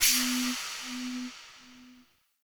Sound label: Appear; Orlick; Friendly